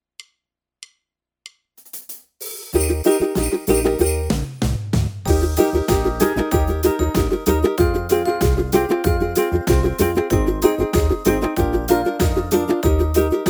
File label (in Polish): choinka instr.; pakiet choinka